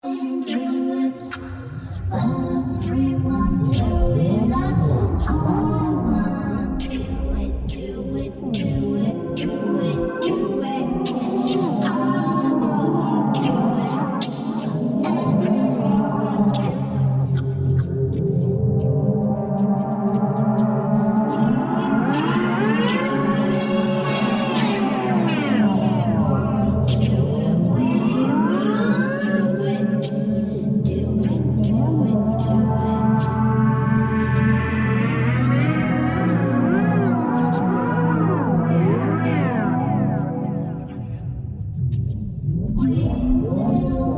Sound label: Ambient Vocal Soundscapes